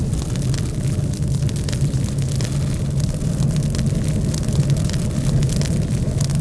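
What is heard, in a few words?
fire cruiser loop; rep cruiser; Star Wars Obi-Wan; XBOX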